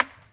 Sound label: ball hit treetrunk; golf; ProStroke Golf World Tour 2007